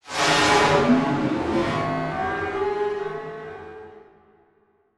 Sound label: Title Transition Evolver Electrical; Production Element Title Transition